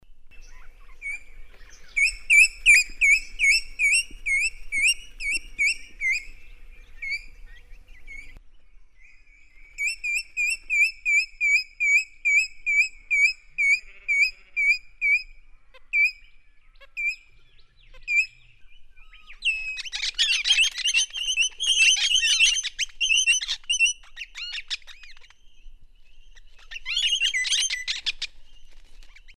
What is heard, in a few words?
Australian Ringneck